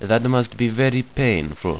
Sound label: painfull